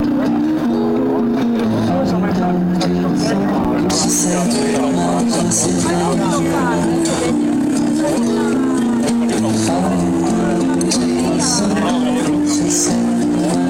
bossa nova barcelona

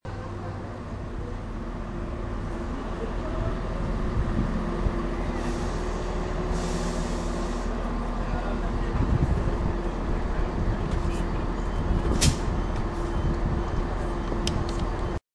station train

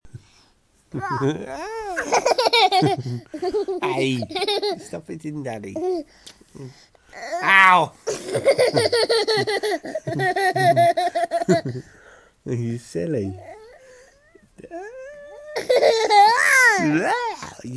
sleeptime with laughter

